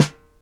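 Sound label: snare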